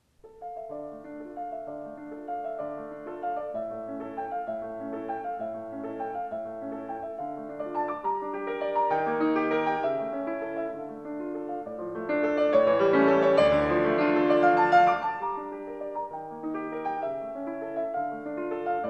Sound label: Piano Sonata No. 17 in D minor; Wilhelm Kempff; Beethoven; Piano Sonatas